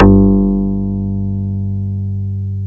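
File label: XYLOPHONE C3, YAMAHA FM SYNTHS; Rubber Chicken Software Sample Bank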